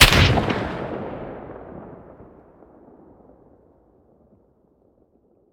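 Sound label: weapon sniper sup fire player atmo ext; gmod; viper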